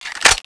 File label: psg clip in